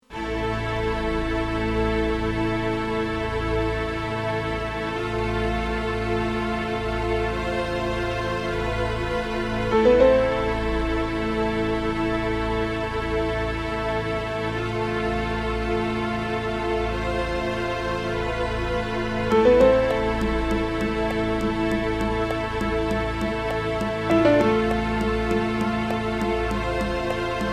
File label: MIDI